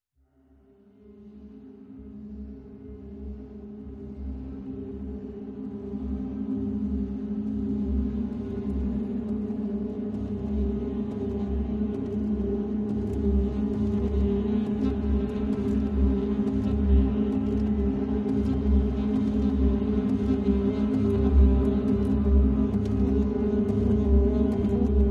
Fantas For Saxophone and Voice

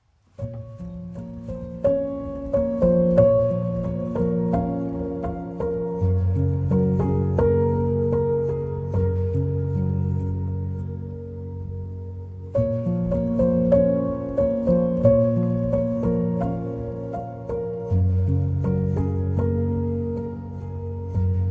Piano Solo

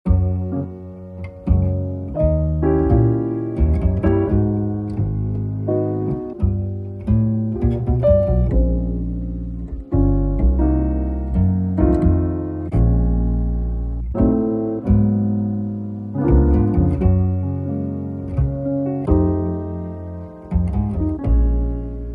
Smooth Jazz Music

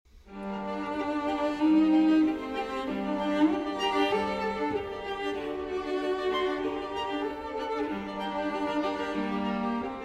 String Quartet in D Minor, II. Variation 1

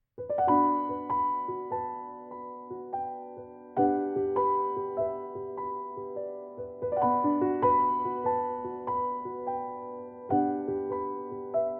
Piano Version

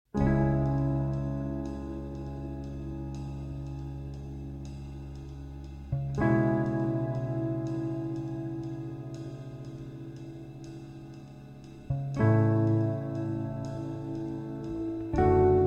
Piano Sonata in C Minor, Andante Sostenuto

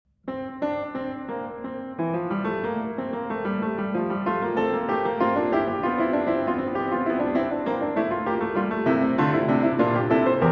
Fugue in F Major; Prelude & Fugue in F Major, BWV 856

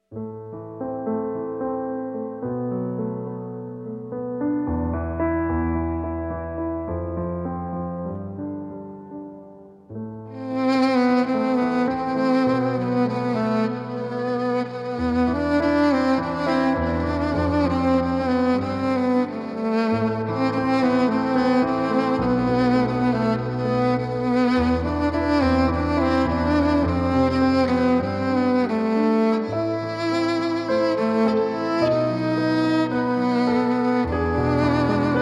Arr. For Violin and Piano

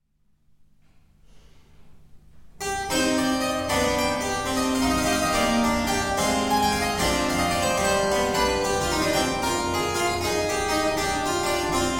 Keyboard Sonata in D Minor, Allegro

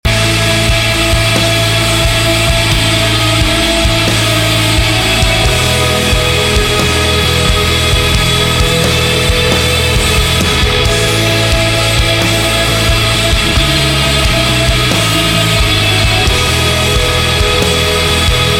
Instrumental

